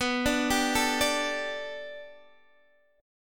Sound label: B Minor 7th Sharp 5th B Guitar DADGAD, chord